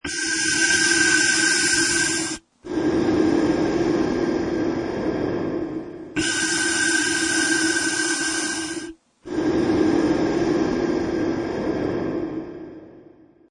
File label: Breathing In Mask, Slow, labored breathing in scuba diving mask or g; Slow, labored breathing in scuba diving mask or gas mask. Also good as Darth Vader effect. Claustrophobic; Scary Breathing Sound Effects; Lynne Music